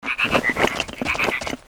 VOLCANIC DEMOLIS WAR ATTACK

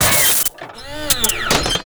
WAR DIE; ARTHROP; Mob

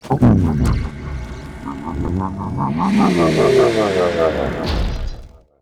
WAR DIE

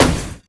Staff Metal, Damage

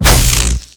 Plasmagun Metal, Damage

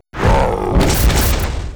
attack; CRAWLERMAUL; Mob

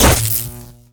Plasmagun Cloth, Damage

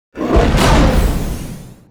hammer Attack; Tor; Mob

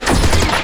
Guard Attack; Mob

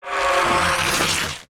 WAR POWERUP; ANABOLA; Mob

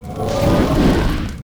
WAR POWERUP; SIZZLER; Mob

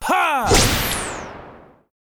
attack; Miler; Mob

